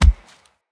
Step Default, Animals, Foley, Steps; Bromius

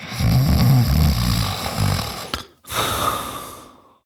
Snores, Son, Vocalizations; Warframe; Deimos

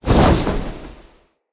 Casting Device Cast Left; Galene

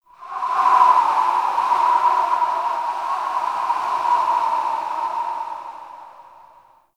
Whistling Wind; Warframe; Tau

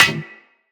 Melee Staff Impact Generic

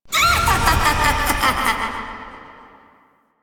Corruption UI Haunt; Warframe; Missions; Zariman

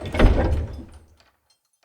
Archway Gate Door Close; UnderCity

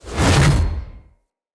Spear Whoosh Heavy; Weapons; Enemy; Spears; NimrodSpear

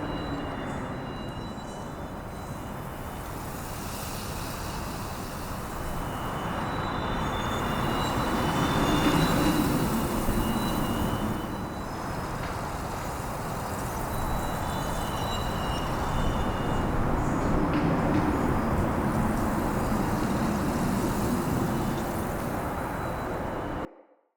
Witch Station Ruins Bed Loop, Ambience; Soul Plane

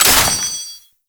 Exalted Sniper Last Shot; Warframe; Frumentarius

